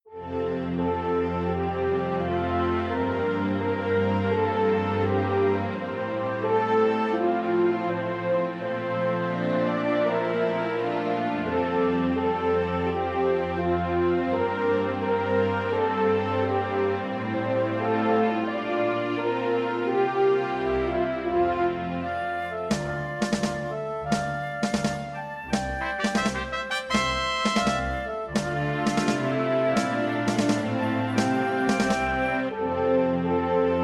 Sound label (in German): nationalhymne ddr klingelton auferstanden aus ruinen